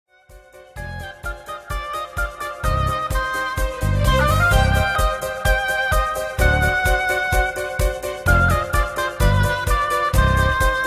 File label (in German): Emotions mit Oboe